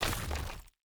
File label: block destruction stone